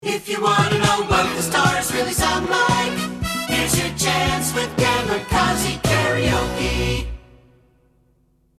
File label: karaoke